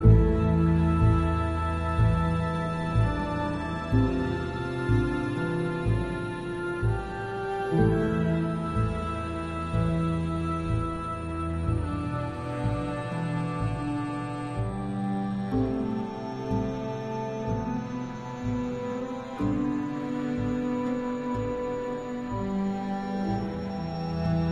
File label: Sad loop